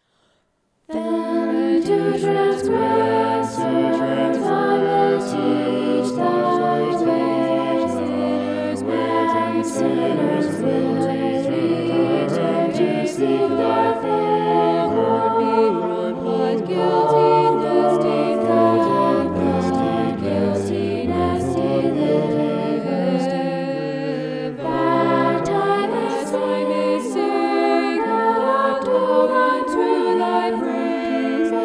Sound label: vocal poly; psalm 51